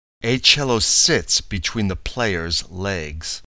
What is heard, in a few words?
cello